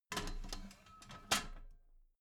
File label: metal ladder small handle unfold soft